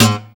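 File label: GUI PULL, acoustic guitar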